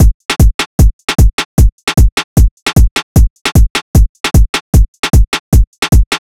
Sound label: Drum Loop 152 BPM, Hip Hop; Drum Loops; Cymatics